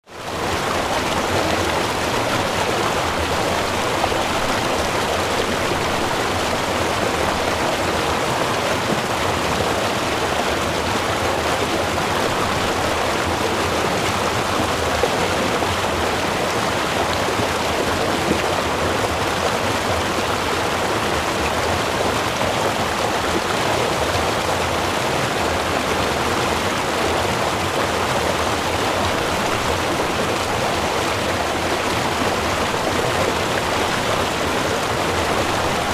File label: river, ambience sounds; River 1